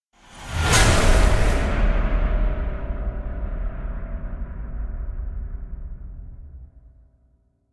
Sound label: evil slams with whoosh; demon